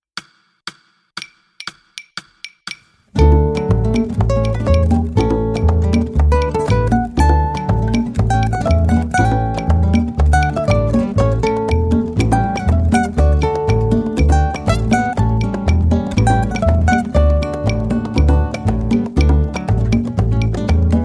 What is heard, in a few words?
Merengue Solo, guitar